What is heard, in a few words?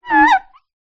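mirror sounds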